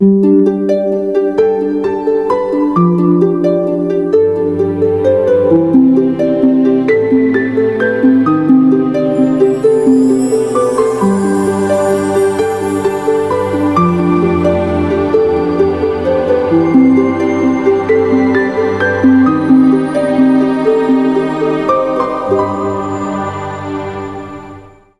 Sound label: Harp warp